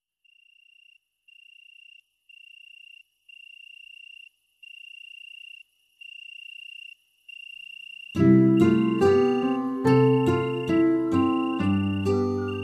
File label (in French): Petit Oiseau Tombe Endormi Instrumentale